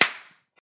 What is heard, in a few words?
Slap; talking tom cat 2 android ios online gamerip 2011